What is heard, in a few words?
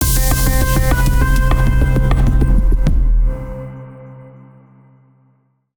wave Complete; calamity-cobra-2